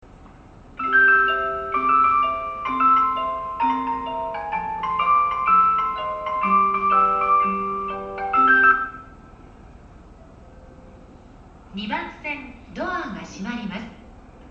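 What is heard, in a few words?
ushiku, departure bell; zyoban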